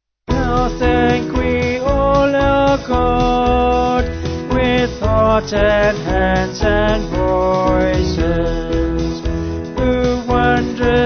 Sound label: Vocals Band; Now Thank We; Nun Danket